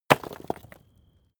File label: stone medium fall short; natur-tiere; steine